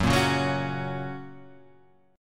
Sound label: Gb Major, Guitar Half Step Down